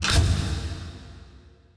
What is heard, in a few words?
spotlight